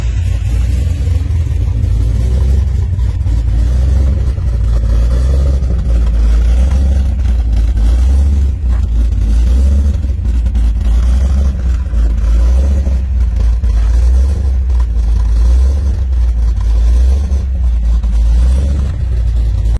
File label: Camaro Full Exhaust Sound, streetfighter full exhaust